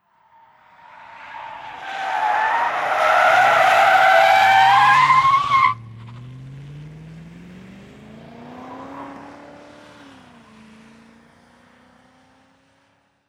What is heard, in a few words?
bmw 540i s driving bend